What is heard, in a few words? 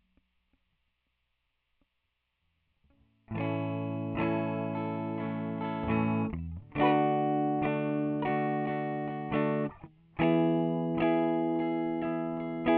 Barre Chords A maj